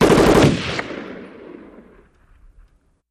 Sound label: Machine Gun Rapid Burst; bursts